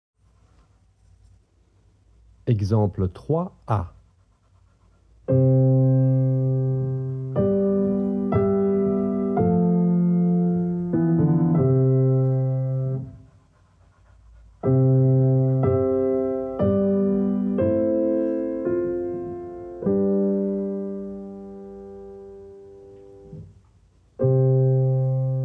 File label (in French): harmonie